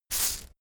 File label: insect shaker hit double soft